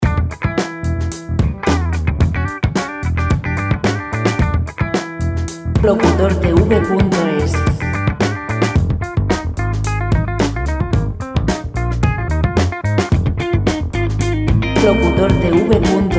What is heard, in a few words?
110 rock; Música rock sin derechos de autor para radio